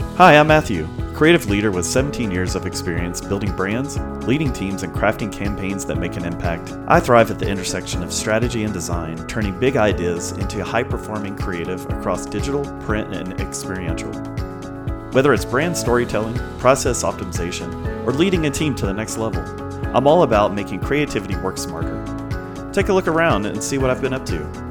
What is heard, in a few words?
intro enhanced music